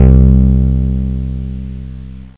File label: synth bas; Groovy Bytes: Behind the Moon